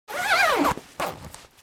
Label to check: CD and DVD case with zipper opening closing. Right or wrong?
Right